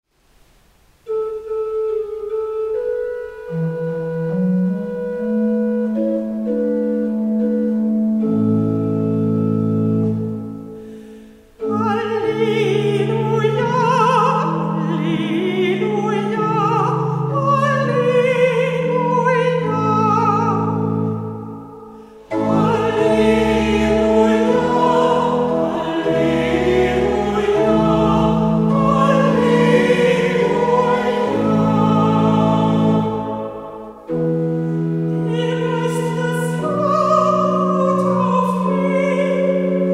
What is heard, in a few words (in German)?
hohe Stimme